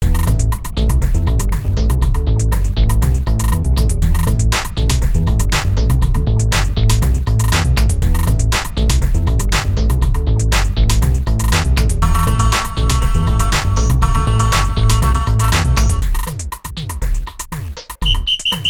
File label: Tablaesque; Nancy Drew: The Phantom of Venice